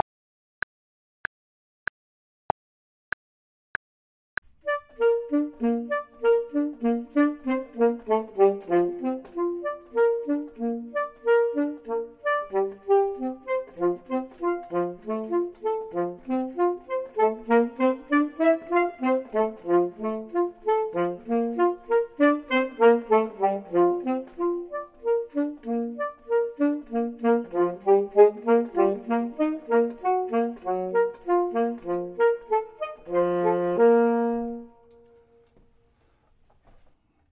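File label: both, Saxophone and Clarinet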